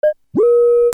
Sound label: SCIFI